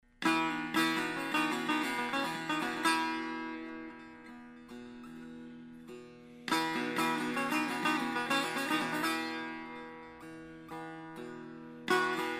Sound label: persian setar